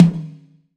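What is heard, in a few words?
Sharp Mid Tom, Roland BK-5 Toms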